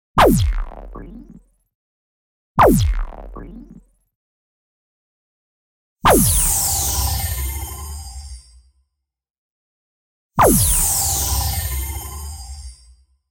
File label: Brain Blast Pew Sine Sweep Sound; Lexi